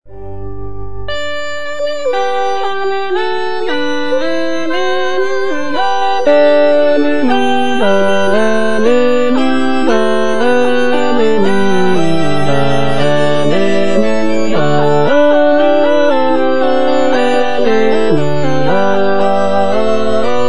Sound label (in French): BASSE Voix Electronique; G.F. HÄNDEL; LET THY HAND BE STRENGTHENED HWV259